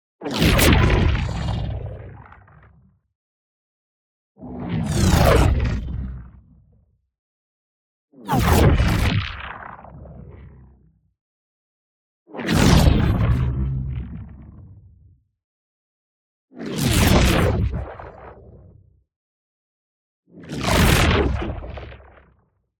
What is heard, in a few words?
WHOOSH MEDIUM Neutrino Nudge; boom library; Matter